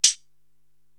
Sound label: Dirty Tambourine; Korg TR-Rack